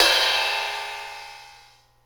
Juz Cymbal; KJ Sawka; Drum n Bass & Beyond; Drum Tool Box

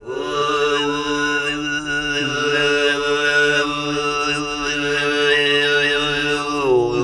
TUV GRP, Vocal World Voices TUVAN GROUP; ILIO; Vocal Planet VOL-5

